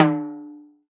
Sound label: TIMBAL, Salsa Kit; E-MU Producer Series Vol. 7 – Old World Instruments